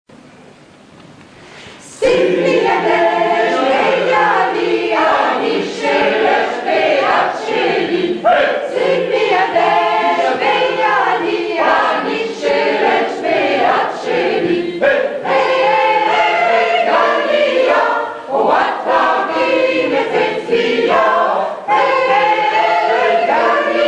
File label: concert; telethon